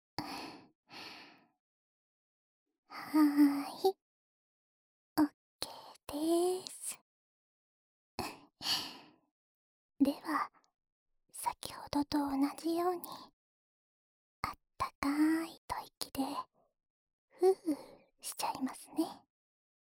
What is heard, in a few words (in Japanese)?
左耳の耳かき, ASMR; お兄さんの弱点、分かっちゃったかもー♪